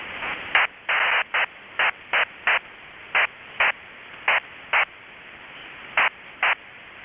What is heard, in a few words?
SECOM-H SLOW FREQUENCY HOPPING WAVEFORM (2400 Bd / SERIAL TONE / QPSK / ~ 9 hops per second); SECOM-H transmission in frequency hopping mode; ROHDE & SCHWARZ SECOM-H